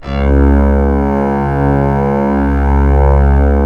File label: C#, strings; Big Fish Audio